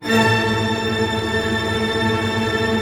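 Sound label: String Tremolo A, Right; Orchestra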